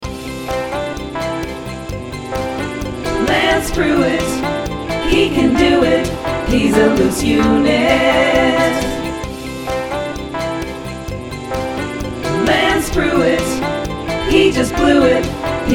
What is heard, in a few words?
Full Jingle